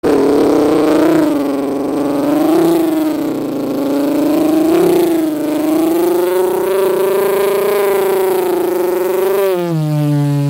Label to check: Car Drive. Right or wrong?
Right